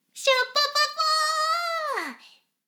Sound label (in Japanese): しこしこ音声; 牧場戦腺; 少佐殿